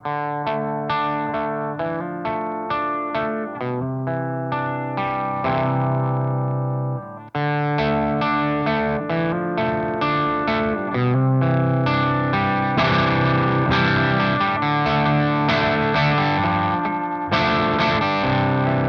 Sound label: Distortion Boost parallel